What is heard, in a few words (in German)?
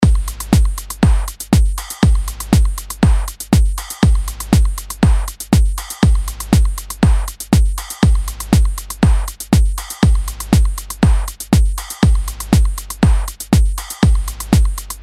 Doppelbass